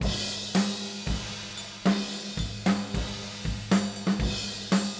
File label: Moon Room